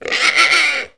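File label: gamorrean attack; Star Wars Knights Of The Old Republic I; XBOX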